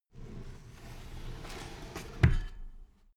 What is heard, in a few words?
drawer cabinet paper close regular long